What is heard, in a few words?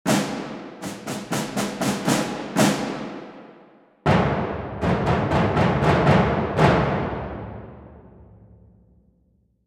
Majestic Percussion; Soundpaint